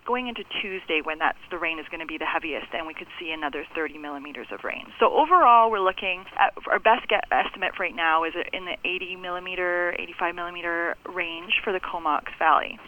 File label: Rainfall; heavy rainfall in the region; Comox Valley; Residents in the Comox Valley are being advised of heavy rainfall in the region.